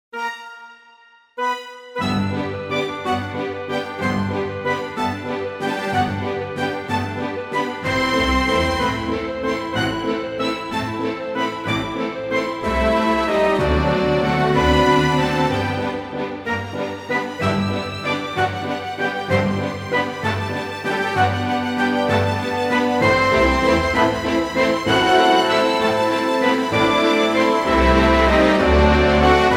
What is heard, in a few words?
orchestra; straussII